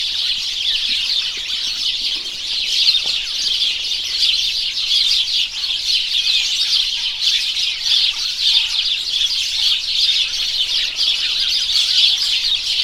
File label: House Sparrow Passer domesticus